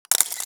camera shutter, Nokia 808 PureView

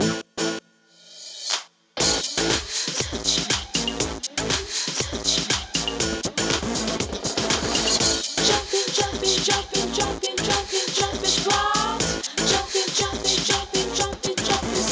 Jumping; Samsung; Galaxy A SHW-M100S

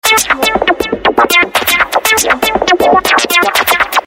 Millenium, Samsung SGH-E250i

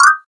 drumset sharp; SKY IM-A830S-VEGA-Racer-2

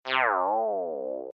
Low Battery

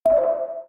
File Received; Coolpad; C558

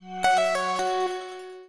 recharge battery; Nokia; 770 Internet Tablet

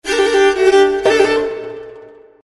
Arabic String, Message tones; LG KP500N Cookie MEA

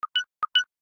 Fully charged; Samsung Z3; Tizen

